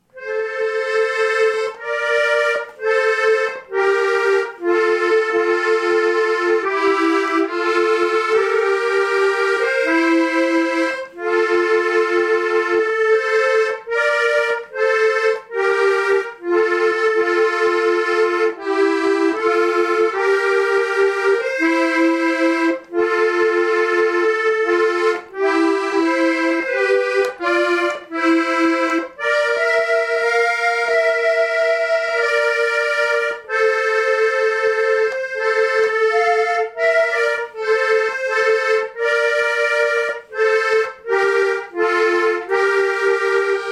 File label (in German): So nimm denn meine Hande ohne Bass